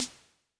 Ana Shh, Yamaha DTXpress-II snare

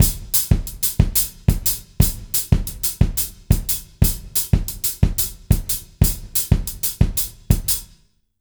Jazz Latin Drumloops; USB Soundscan vol.08; AKAI

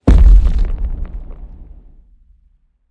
kick missle

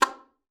C4, alto sax; Xsample VOL-2

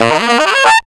brass riffs & licks; Total Drum&Bass

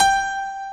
AC PIANO; PIANOS+KEYS; Pro-Rec - Synthsonic

